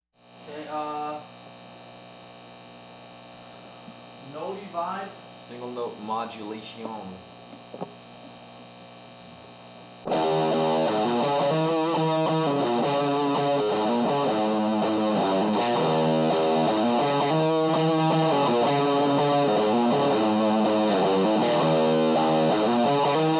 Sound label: Riff II